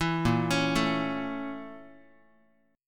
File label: A sharp Minor Major 7th Flat 5th A sharp Guitar; A#mM7b5 arpeggiated; JGuitar